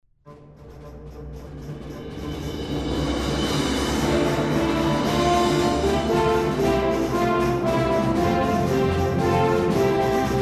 激情的背景音乐

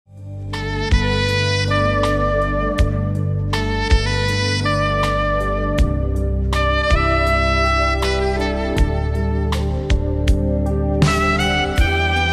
彩铃背景音乐

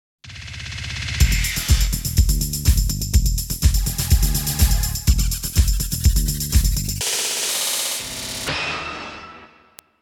电视包装音乐

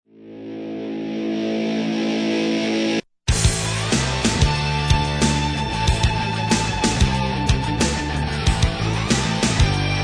片头音乐

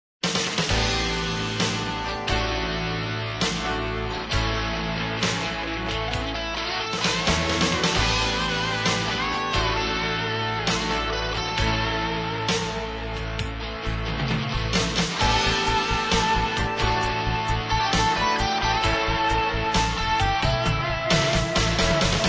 片头音乐

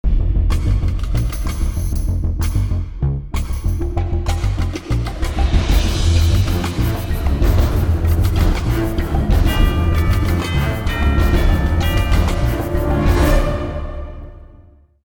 大气的背景音乐

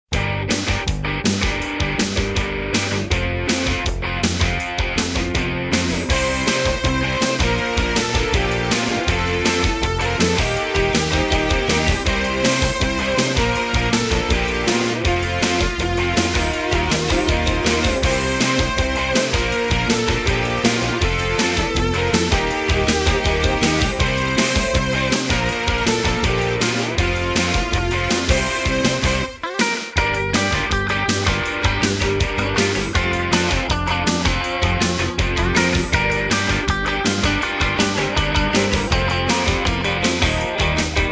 片头音乐

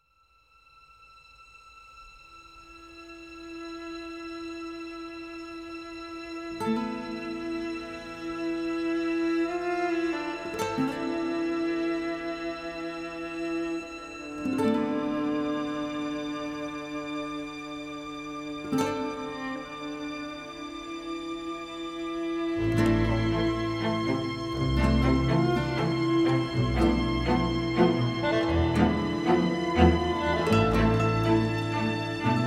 广告片音乐

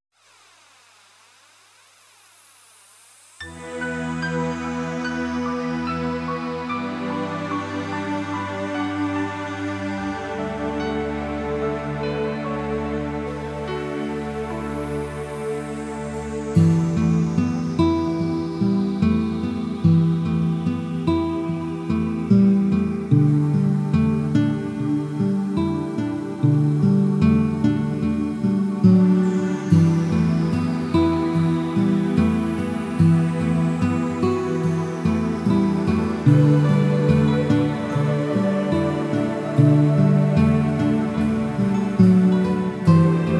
纪录片背景音乐

